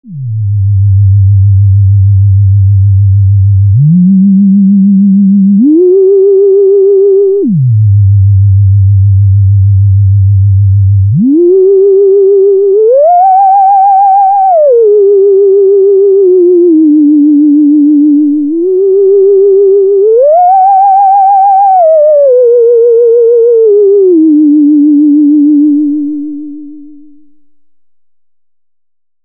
SH01a Filter Self Oscillation Keyboard Tracking Ghost Theremin